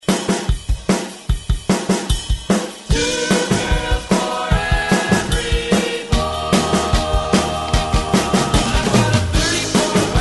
60s Re Drum 148 Bpm; DJDOLLS

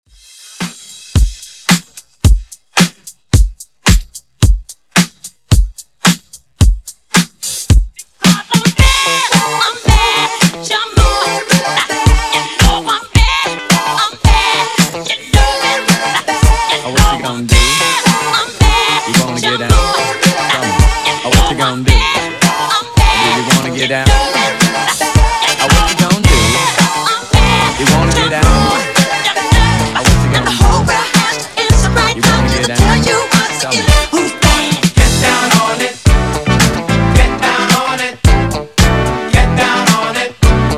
Intro Hype 110 Bpm

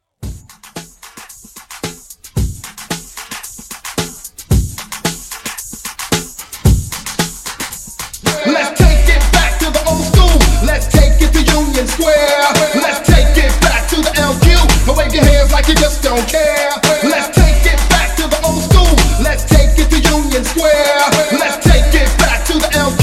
Old School Hype, 112

